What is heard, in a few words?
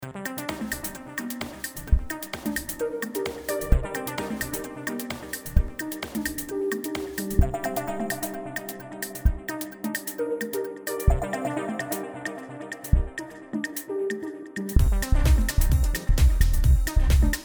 EDM INST